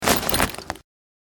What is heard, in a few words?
corpse open; Original TK Sounds